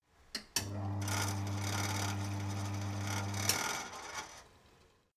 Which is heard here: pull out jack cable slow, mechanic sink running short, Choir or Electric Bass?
mechanic sink running short